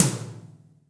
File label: ROCK TOM; Big Fish Audio; Synth City